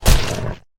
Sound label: hog hit; Original TK Sounds